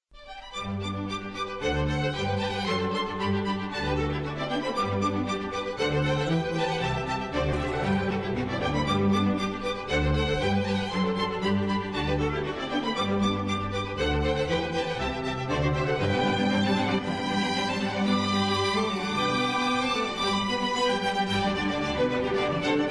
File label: ORCHESTRAL